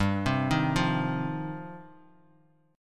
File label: G 7th Suspended 4th Sharp 5th, Bass Standard; G7sus4#5 arpeggiated